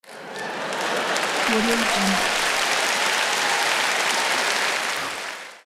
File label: crowd laughing; greenwaysound